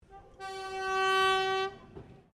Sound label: Train Diesel Shunter VA1 Class 1947 Tasmanian Horn; Bellarine Tourist railway vehicles; Trains